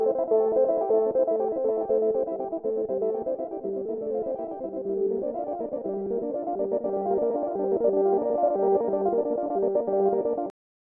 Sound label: lfo